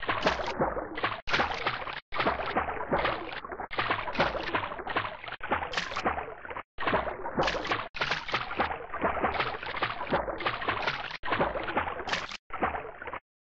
Erase Static; Mario Paint

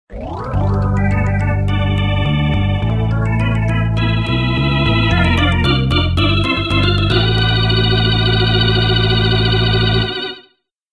Music Flyover; MKDS; Wario Stadium

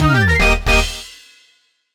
Gift Gold Fanfare; SM3DL

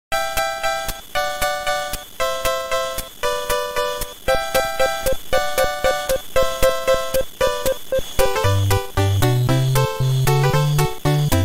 Snow Theme; SMM2; SMB3

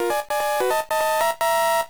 Sound effect of Timer Warning; SMA2

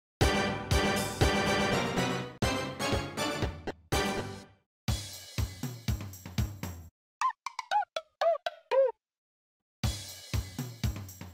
Index Jingles; WarioWare Gold

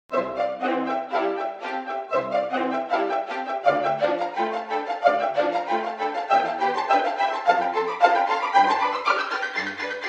BGM; SMBW

